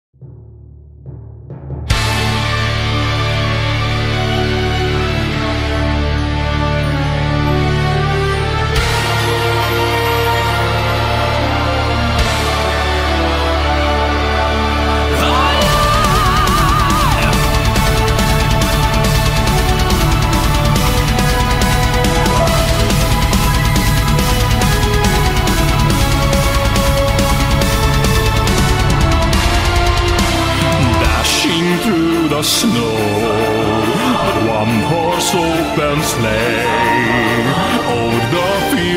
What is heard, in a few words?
Jingle Bells Epic Version; Natal